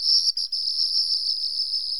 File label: BIRD, bird call; Greytsounds VOL-1; BIRD CALL FX